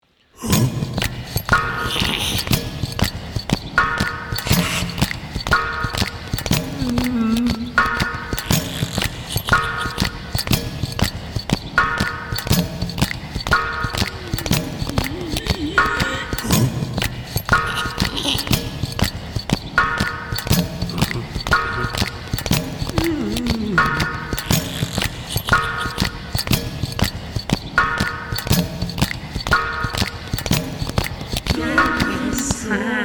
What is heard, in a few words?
song